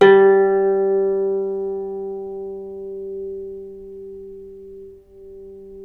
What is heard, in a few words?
CEL HARP G2; USB Soundscan vol.23; Historical Instruments; AKAI; CEL.HARP1